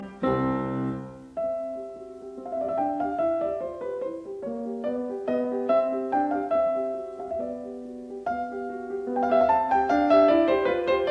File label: Piano Sonata No 10 in C major K 330 III Allegretto; Lili Kraus